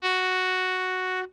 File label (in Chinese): 喇叭 F#5 melo; 音MAD; 日常